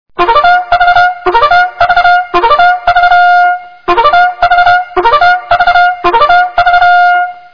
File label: first sgts, Bugle calls; American Civil War